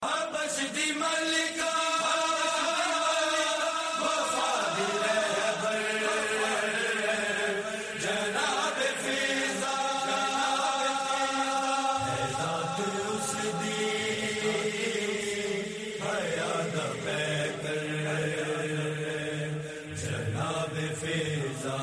noha tune

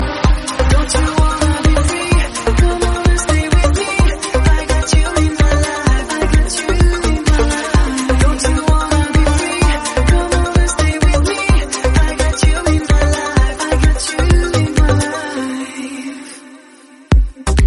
english tone ringtone

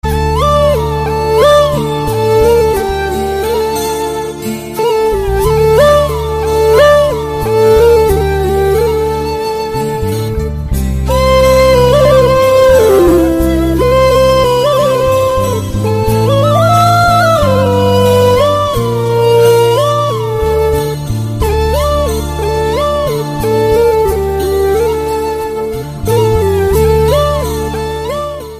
heart touching flute, ringtone

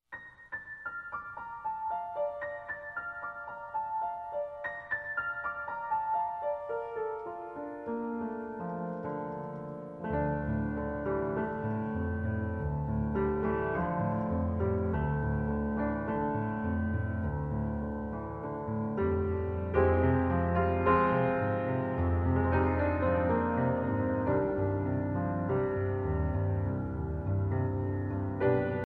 piano mystry ringtone

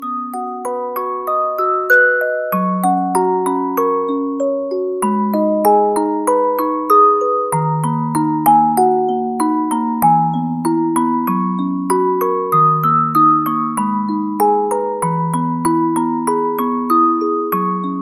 beautiful bells ringtone

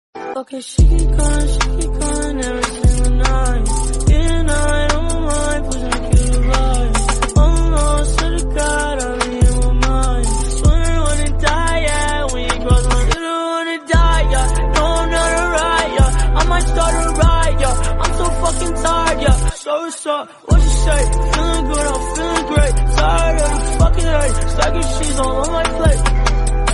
english song tone ringtone

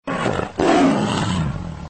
tiger roar, ringtone

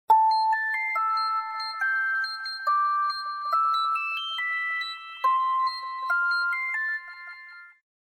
nokia n97 sms ringtone

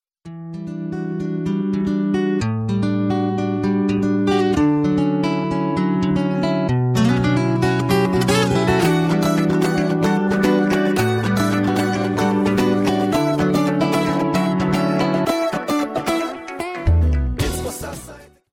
soft guitar music tone ringtone

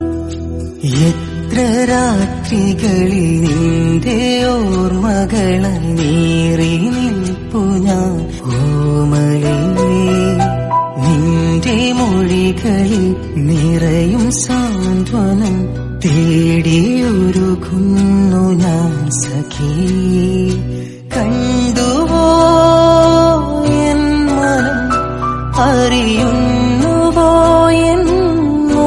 malayalam nostalgia ringtone

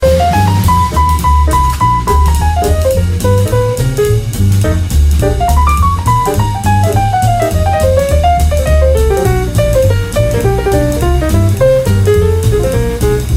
jazzy ringtone; samsung ringtones

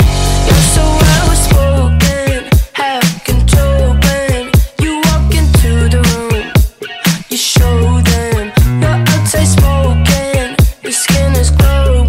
cool music ringtone

